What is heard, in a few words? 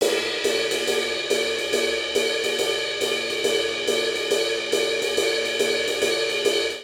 Swing Jazz Cymbals Only 140 bpm; MIDI Clips; Ableton; Factory Packs; Drum Booth